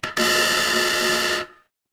feedback buzzer

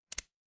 paper fabric cloth whip

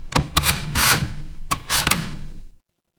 mechanical machine

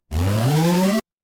retro game jump

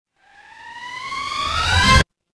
transition riser

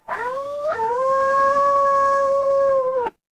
animal cat meow

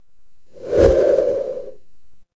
transition whoosh

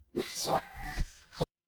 ui undo

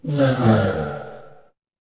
feedback error

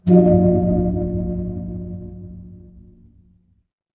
magic scifi shield

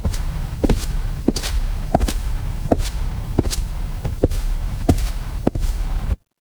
footsteps concrete

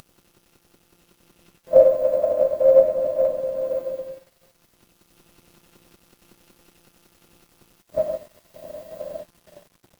horror ambience dark

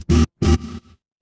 feedback error

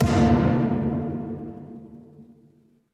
transition braam

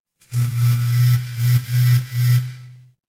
office phone vibrate

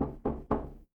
door knock

